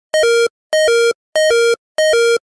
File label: low hp; pokemon